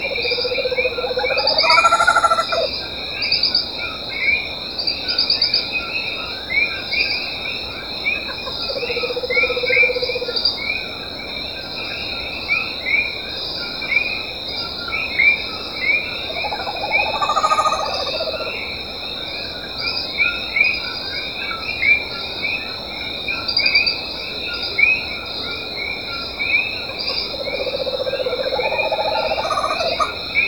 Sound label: Megascops nudipes plus coqui